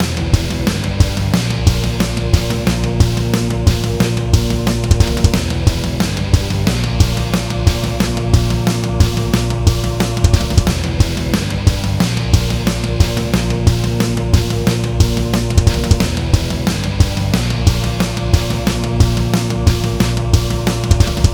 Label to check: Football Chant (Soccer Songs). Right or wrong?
Wrong